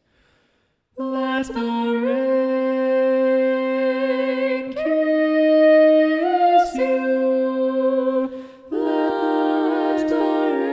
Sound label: SATB